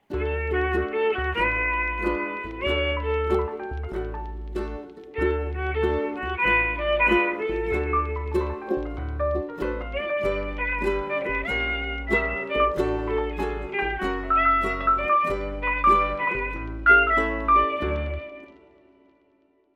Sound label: violin jazz